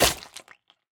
Squished Impact; Boomerang Fu

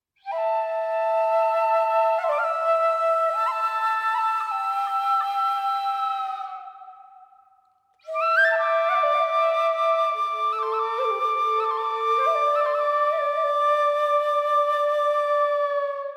Instrumental; Xenoblade Chronicles 3

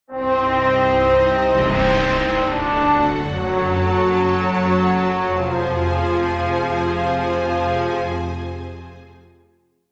Lose Battle; Stronhold; heroes of might and magic online 2010 pc

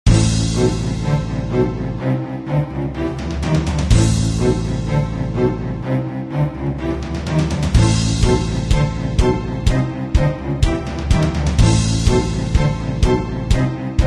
Music Dark Cave; Candy Thieves Tale of Gnomes

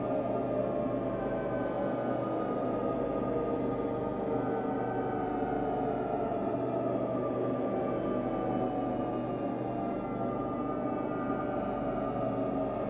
Pad Music Loop; Potato

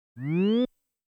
WINDOW OPEN

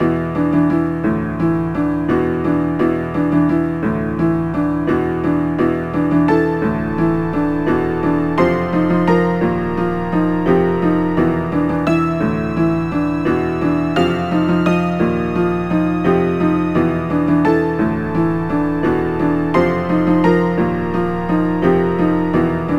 Dance Rumba Medium; knigge spielend zum guten benehmen; nds

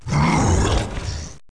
spawn shield

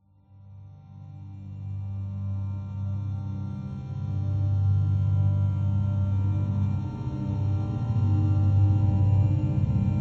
Atmospheric Mystery Loop

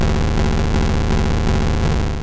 Fire Punch; sfx; attack moves; pokemon; gen 2; gsc

